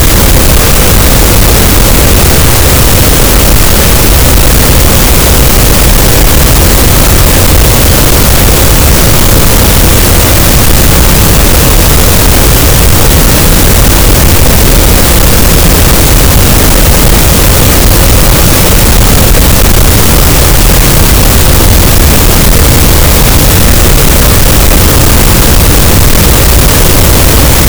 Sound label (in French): cachalot, Physeter Macrocephalus, gaussian noise